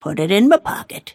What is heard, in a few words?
last hit pain; snapfire